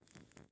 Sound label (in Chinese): 蜡烛环境声; ambient